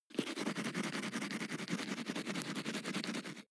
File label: teeth brush dry closed molars fast